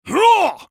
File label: spell cast effort; Pantheon